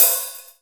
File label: DR550; Best Service Gigapack 2